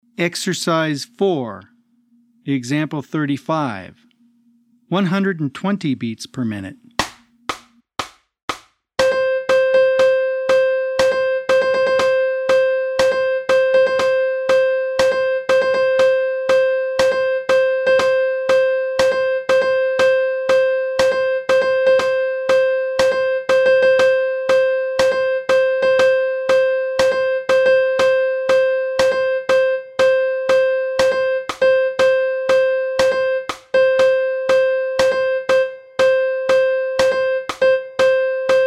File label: 120 BPM